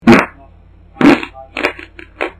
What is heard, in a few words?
fart